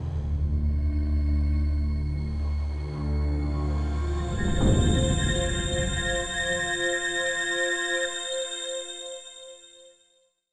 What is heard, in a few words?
spooky